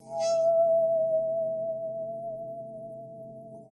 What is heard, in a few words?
Music saw